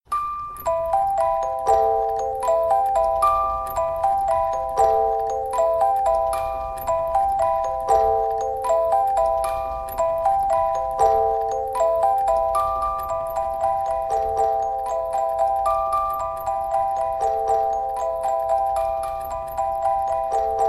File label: melodie phasing; pholk